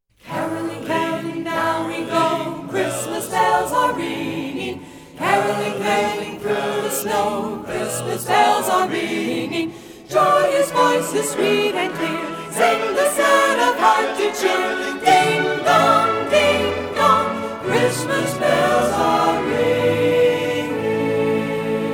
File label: Caroling